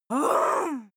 female groan angry; koerpergeraeusche; menschen; soundpool

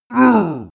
male giant hit; menschen; schreie

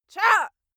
female attack; schreie; menschen

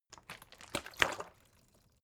bottle water sparse upend slosh; berufe

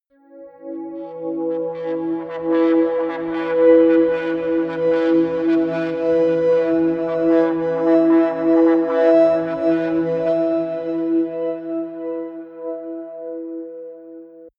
atmospheric feedback pad mid, drones

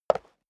step high heels concrete single left; schritte; Footsteps

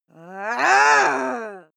female aggressive snarl rise; schreie; menschen

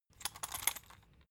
salt mill metallic slow; gastronomie; soundpool

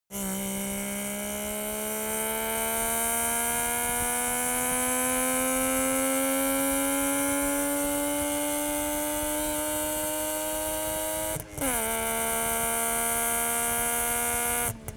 car saab 92 accelerate short exhaust

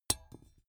small metal drop wood sharp

